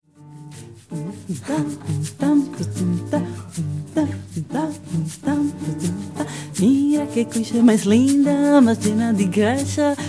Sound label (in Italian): sigla bossanova